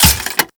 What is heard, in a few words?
Ghoul Crutch Step Dirt, Footsteps; Warframe; Grineer Ghouls; Ghoul Drill Sergeant; Foley